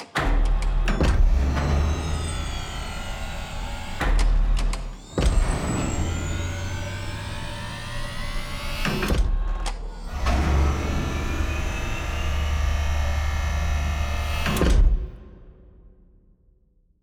Mecha Ambiant; edge of eternity

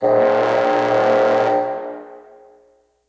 Death

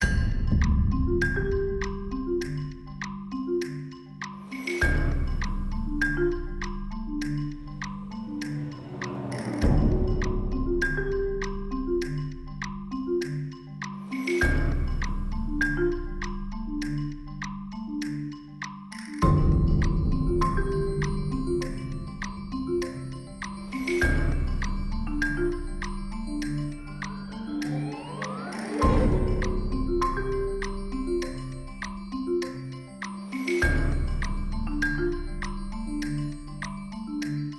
Arcade Countdown; LittleBigPlanet PS Vita; interactive music

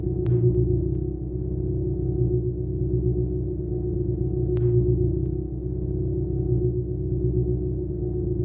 Final Particle Magic Sound; apocalipsis

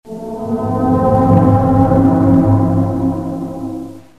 Castle Ambience; dragon lore

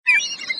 beast sound; Caravan Beast

